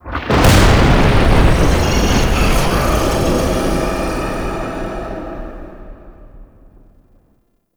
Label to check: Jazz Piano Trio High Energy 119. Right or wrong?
Wrong